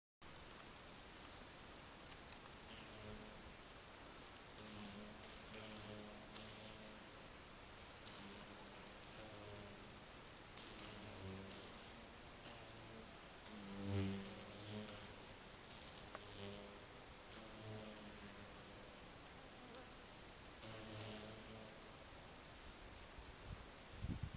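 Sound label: cockchafer sound; majskij zhuk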